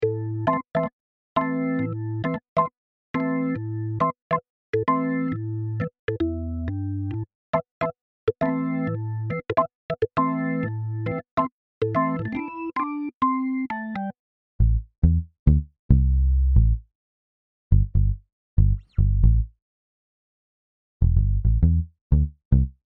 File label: reggae loops